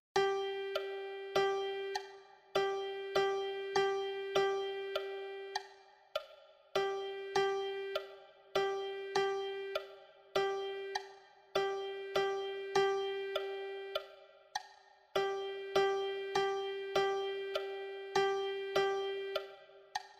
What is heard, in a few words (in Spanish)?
percusion